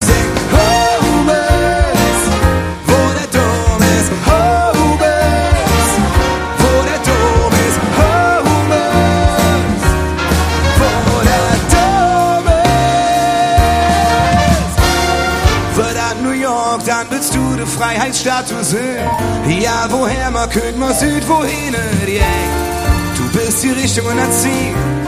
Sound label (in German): live mit orchester